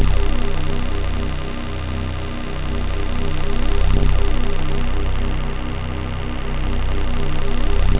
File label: dead Tone; VotV; VoidMod2